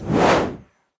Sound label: whoosh tiny; egypt stone war online